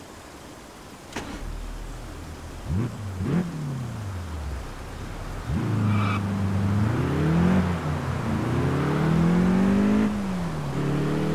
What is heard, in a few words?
audi a6 4.2 quattro; TDU-1